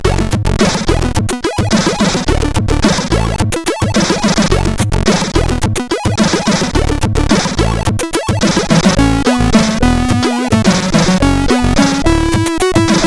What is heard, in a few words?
sid CSG8580R5